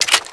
scar mag out, weapons SCAR-H

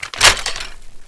M249; weapons

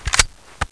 scar clip out

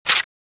de slide back

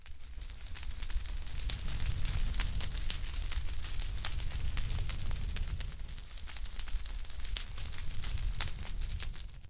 fire sound; dust minecraft

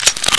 glock clip out, weapons

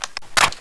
famas clip out, weapons

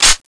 usp slide

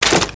slide pull, weapons

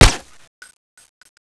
tmp, weapons

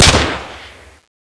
usp

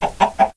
Chicken idle; dust minecraft

